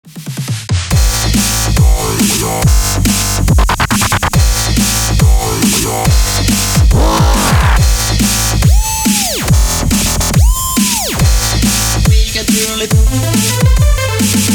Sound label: dubstep glitch downtempo